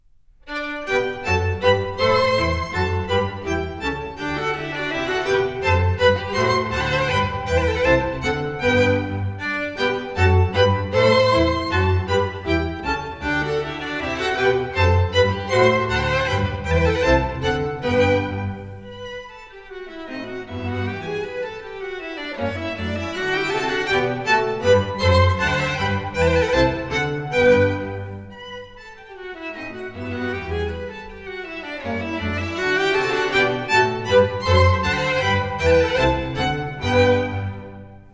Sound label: Serenade in G Major Menuetto Allegretto; KV.525; Eine kleine Nachtmusik